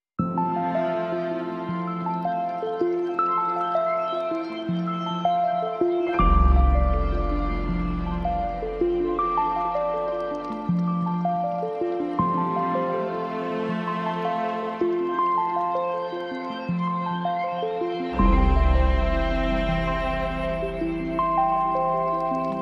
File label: Piano FX